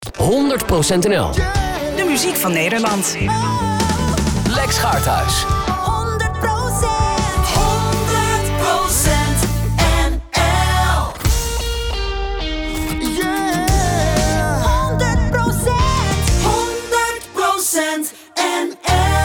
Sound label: jingles